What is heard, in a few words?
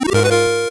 8bit se